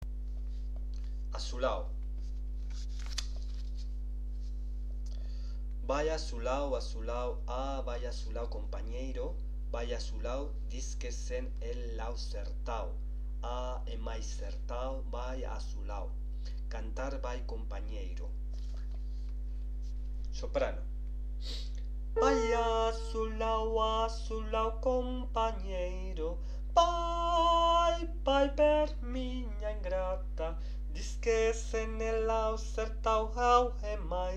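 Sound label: soprano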